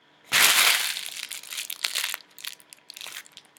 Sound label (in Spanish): casa plastico ahorcar